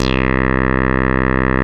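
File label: synth reso clav; AMG - Now CD-ROM (Roland); G.T.M. Pads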